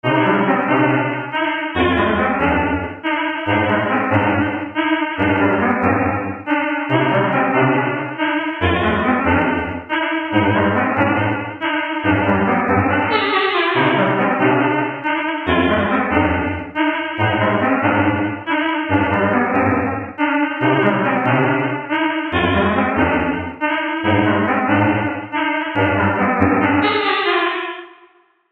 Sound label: thunder brass; cirrus